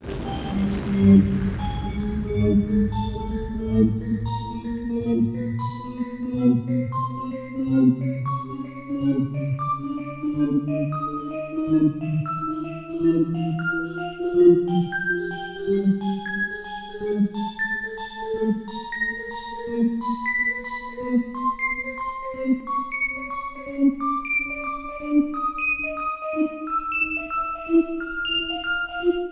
Music Main; Twingo

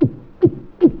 Gulp; OverTheBrink

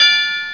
winner; MexicanaChillitime; ClubFusion

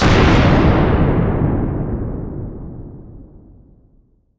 Bomb Explosion; CashBomb

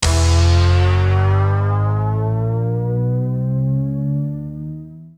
Bonus Wheel Symbol Anticipation; ClubFusion; Showtime Classic

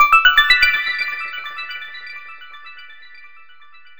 Winline; G-Star; Super Fruit Chutes

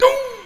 Incorrect; OverTheBrink

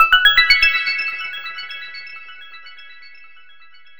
Winline; ClubFusion; Super Fruit Chutes

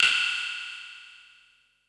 Trail Bonus Select Noise; CashBomb

